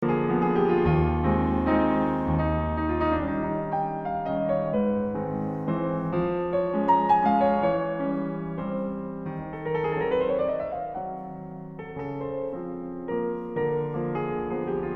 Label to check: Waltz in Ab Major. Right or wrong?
Right